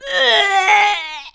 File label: death; Alien Arena; cybergirl